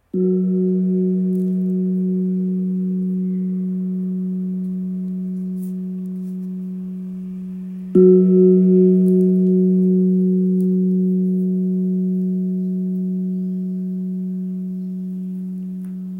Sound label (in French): Gong birman Fa dièse 3kg